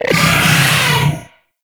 Arachnoid Berserk Vocal Attack; Enemies; Vocals; Warframe; Corpus; Arachnoid Hunger

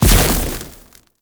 Casting Device On Damage Impact; Weapon Impacts; Player; Casting Devices; Ode Casting Device

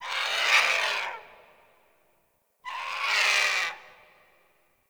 Zone Tree Critters, Ambience Plains; Warframe; Eidolon Plains; One Offs

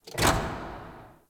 Worker Lever Pull; ambience; props; one offs; Warframe; Venus Open World; Venus Solaris United

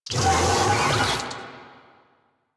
Infested Big Ashen Vocal Attack; Warframe

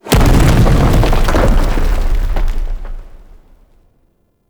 Boulder Land, traps; Objects; Champion Crypt

